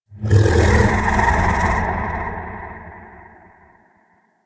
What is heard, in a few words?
Vocal Captured Lift Away, critter; Warframe; Hunting Critters; Undazoa